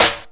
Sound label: SNARE, Skanky Perc; Hip Hop; E-MU Formula 4000 Series Vol. 1; Hip Hop Nation